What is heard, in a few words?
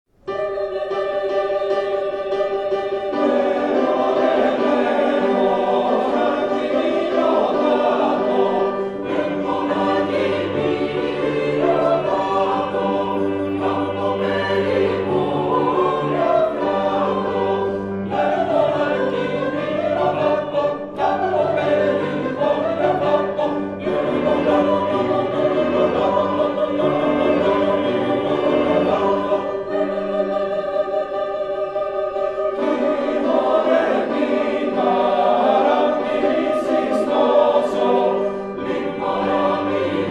Choir; NTUA; Lemonaki